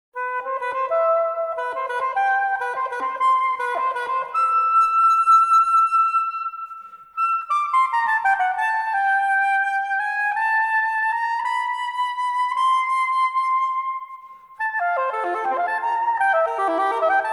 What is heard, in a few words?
cadence